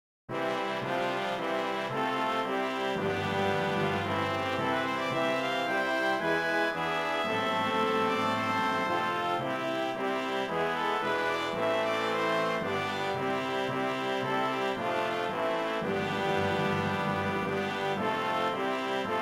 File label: Hymn